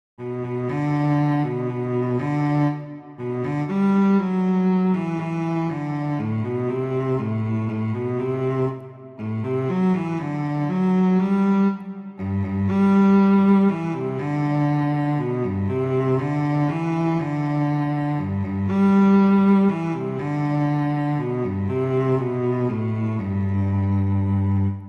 Lullaby; Very Easy Collection; Brahms

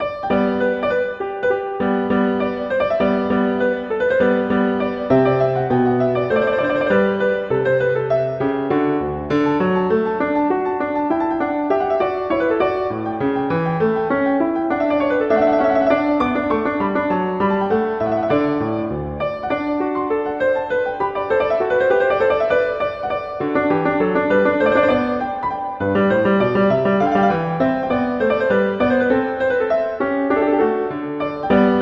Allegro; Haydn; Sonata 8; Hob XVI 8

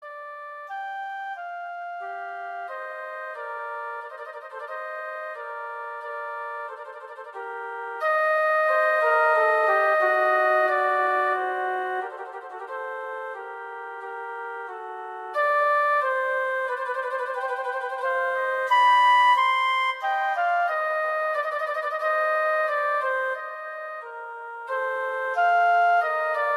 Larghetto; Quantz; Duets; Duet 1

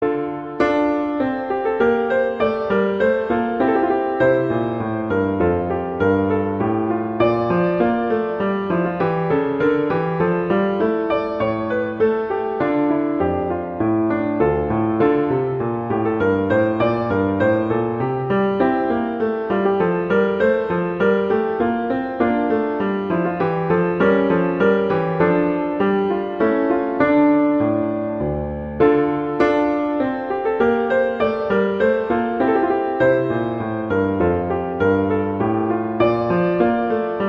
Menuet; Bach; French Suites

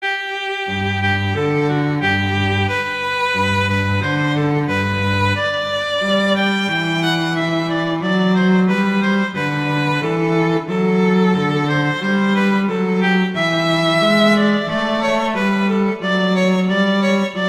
Duet; Mozart; Duets

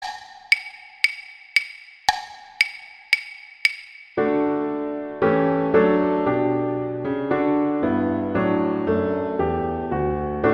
Ode to Joy acc; Beethoven

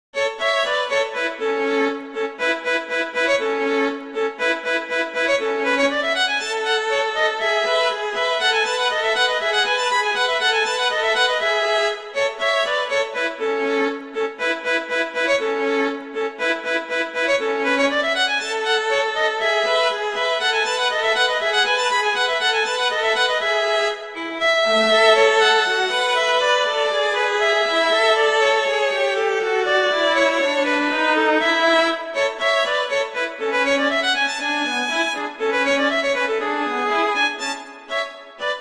Duet; Mozart; Duets; Vla

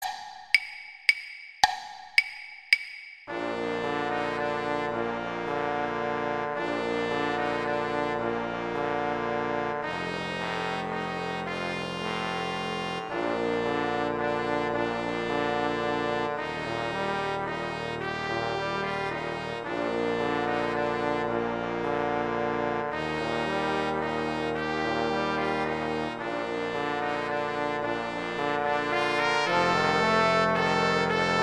Trumpet I in Bb; Brass; Gruber; Silent Night

